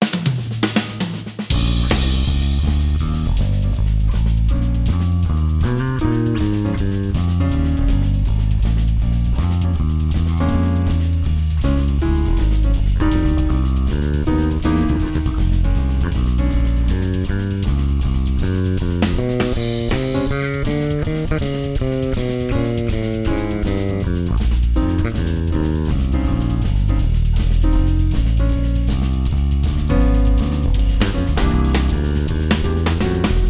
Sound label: bb blues